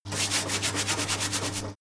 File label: mechanic sink running water brush